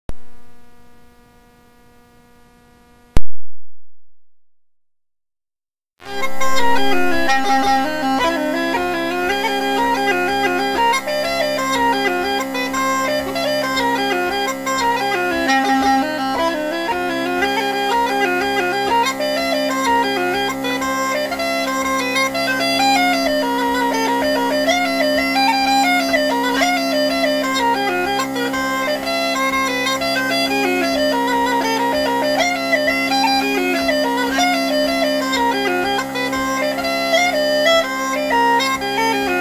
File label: C sample recording, uilleann; whitmer; scott estes